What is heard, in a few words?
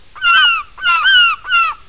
gull